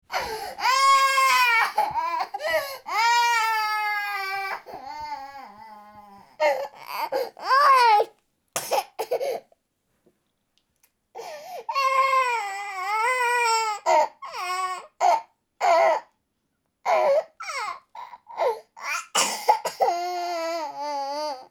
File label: Baby Toddler Vocalizations Crying Sleepy Coughing Night Terror Very Upset; Oh Baby! Pack 3 Production Sound Effects